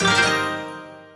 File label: effect symbol hit wild